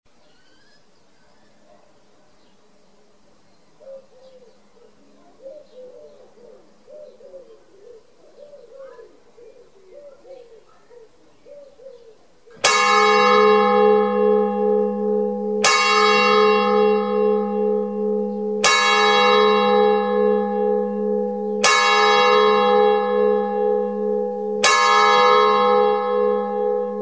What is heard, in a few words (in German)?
glocke